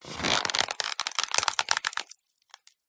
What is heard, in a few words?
Foley Styrofoam Creaking Breaking up Creak; PMSFX